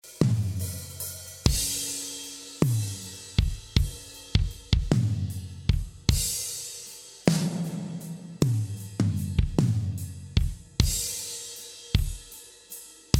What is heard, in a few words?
DRUM THRESHOLD 0; compresor de audio